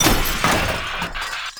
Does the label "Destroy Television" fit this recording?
Yes